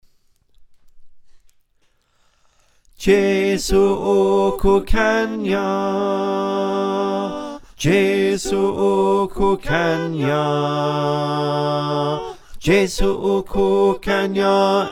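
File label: bass, choir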